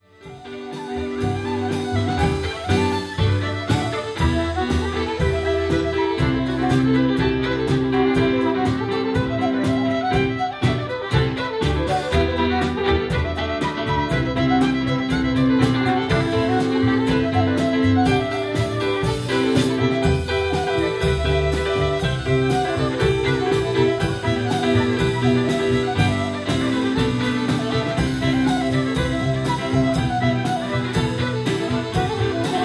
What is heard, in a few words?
Ceili Band Reels